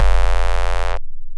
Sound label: Distorted Bass